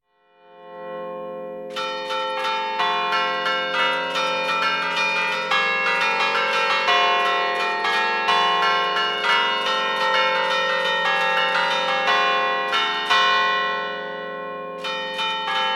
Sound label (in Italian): campane, marcia per cinque campane; Giampietro Migliorini, di Bergamo; appresa da Agostino Casari (1901 - 1978); campanaro di Martinengo